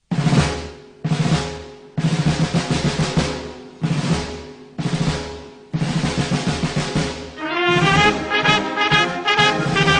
Deutsche Hymne, ringtone

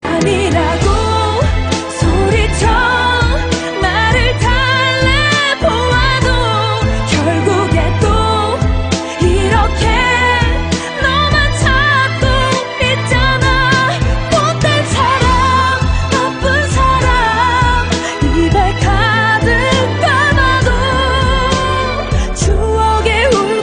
Orgel Version

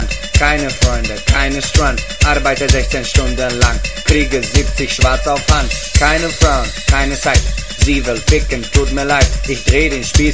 Arbeitsamt song, ringtone

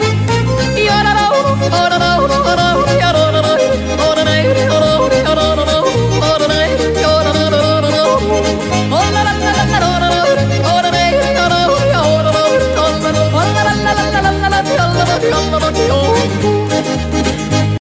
Jodel Time

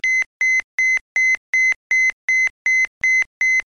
Sammelruf

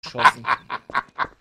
fiese Lache

